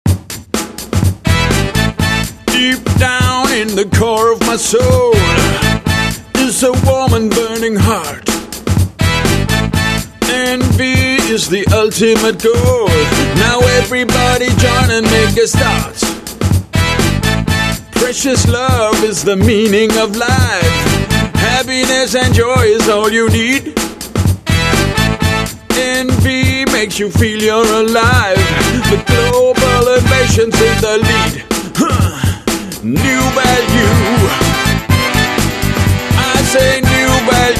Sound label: Funk